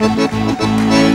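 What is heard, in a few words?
accordion groove; Best Service; World Colours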